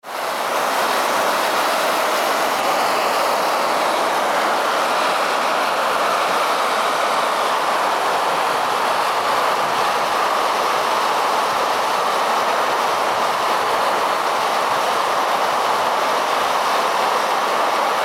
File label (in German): Myvatn Rauchender Dampfkegel